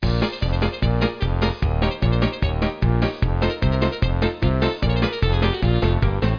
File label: game song; Education Publishing Concepts